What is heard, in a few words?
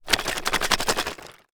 Foley Wood Chips Plastic Tub Rattle Fast Rattle